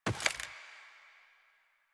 Steps

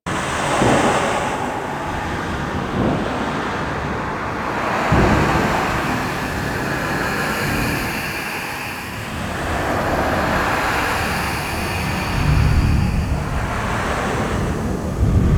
storm marking loop; Warframe; Warframes; Ninja

